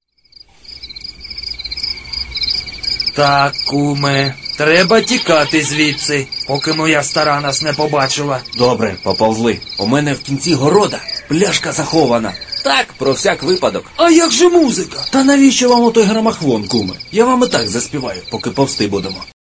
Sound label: call